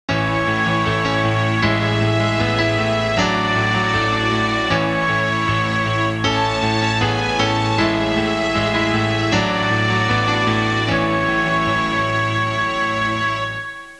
musica sinfonica; symphonic

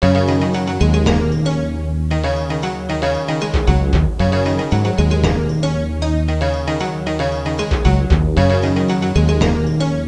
suonerie contemporanea; musica contemporary

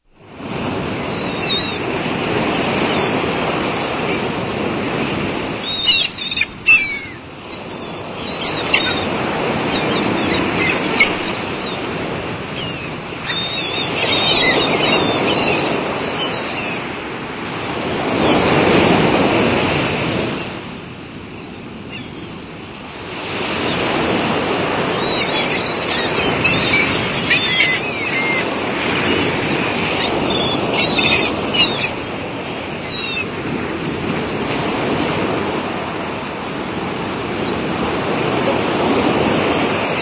uccelli birds, animali

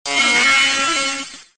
suoneria botton; beep

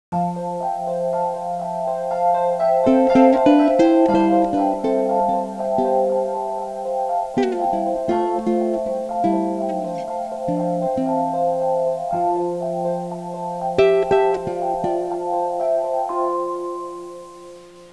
musica newage; Suoneria

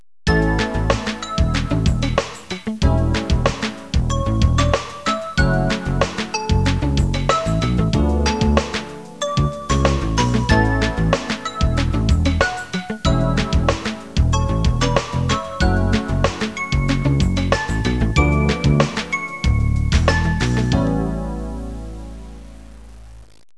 suonerie businessy

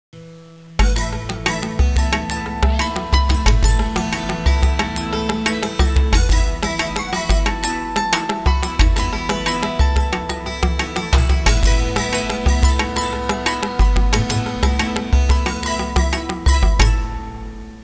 suonerie musica hawaiian

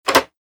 suoneria botton; beep

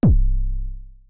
suoneria botton; beep

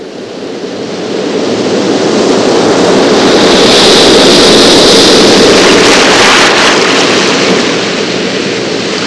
natura nature

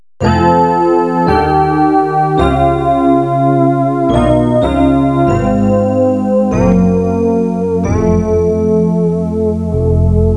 musica newage

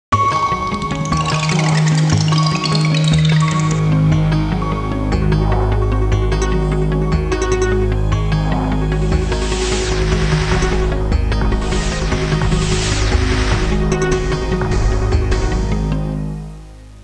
musica elettronica; electronic

